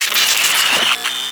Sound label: Gas City Private Trans Out; Alad V; Warframe; Corpus